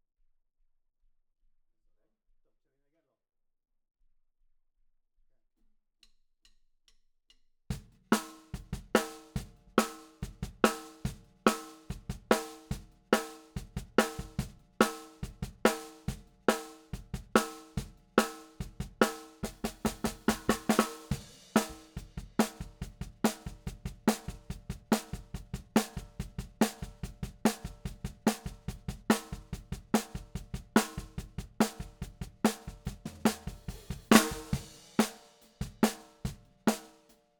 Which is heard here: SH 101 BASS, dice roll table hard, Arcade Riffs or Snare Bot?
Snare Bot